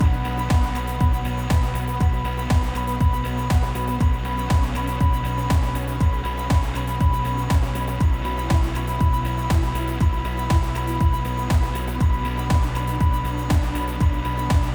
Dash 8CH 120BPM

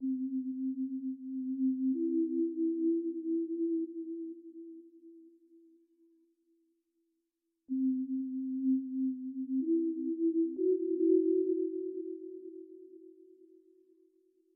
Room

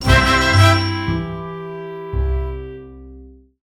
Finishlevel; Super Granny 3; windows gamerip 2006

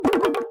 Caramel Miss Sound; Jolly Battle

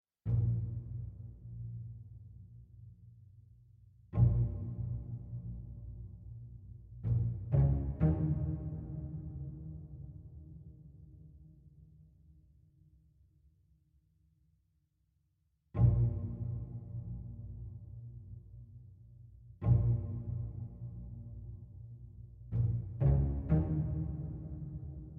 String

